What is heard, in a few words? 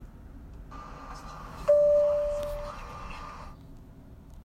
Single ding